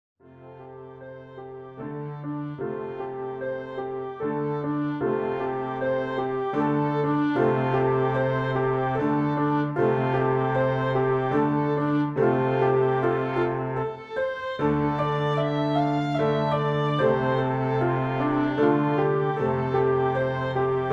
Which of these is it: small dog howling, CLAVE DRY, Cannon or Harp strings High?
Harp strings High